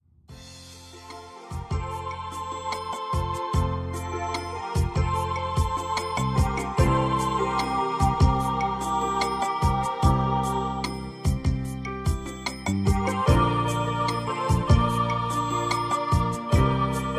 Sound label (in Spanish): Balada Latin, latino; ritmos